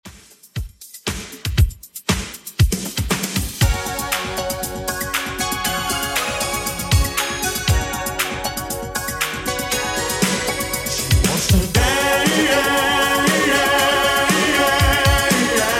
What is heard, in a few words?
80s Redrum 118bpm